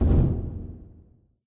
Fall Impact; doodle jump 2; android

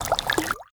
fill skull

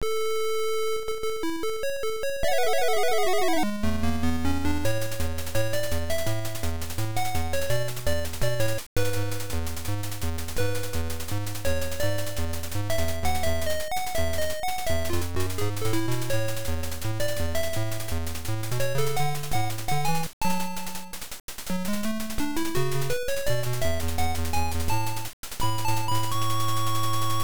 Game Set (Won); Pro Yakyuu Family Stadium Pennant Race; MSX2

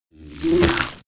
Wasp Die

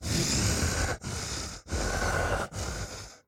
Walk Cycle Burns; The Town

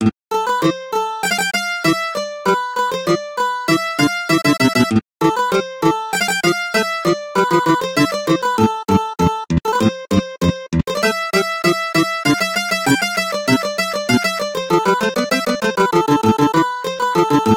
Mandolin

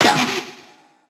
Froster pain; Fight Knight

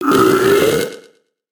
barf windup

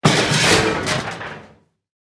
trashcan fall

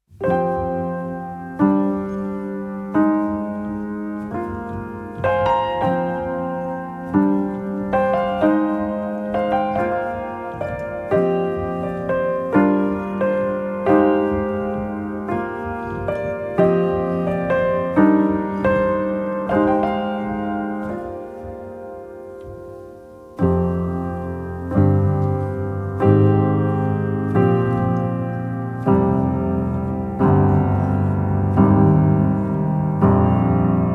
piano version; far changing tides

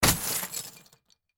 SFX Break Glass; this is the zodiac speaking 2020 gamerip switch ps4 xbox one pc macos

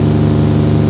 Audi Rs6 Low

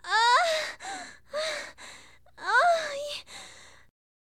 Sex Lines Orgasm; Hilzartov; Fairy Size Doesn't Matter

